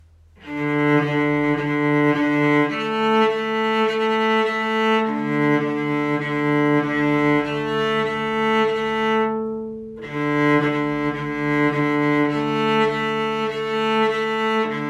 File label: Cello arco